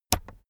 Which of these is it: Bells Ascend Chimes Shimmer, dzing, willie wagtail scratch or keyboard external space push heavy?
keyboard external space push heavy